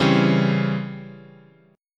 Db Minor Major 7th Flat 5th, chord

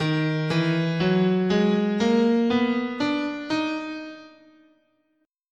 D sharp Neapolitan Minor, scale

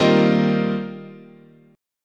D sharp 6th Add 9th, chord

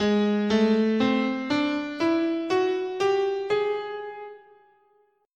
Ab Enigmatic, scale

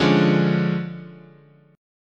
D Minor Major 7th Flat 5th, guitar chord

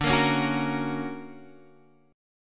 F sharp Major D

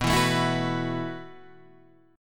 B 7th Suspended 4th B

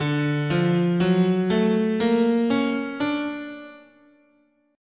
D Augmented, scale

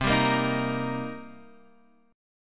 C Minor 7th, chord

